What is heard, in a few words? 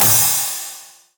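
axe throw cymbal; pentakill olaf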